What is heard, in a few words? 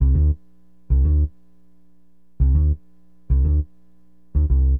BASS, Acid Jazz Funk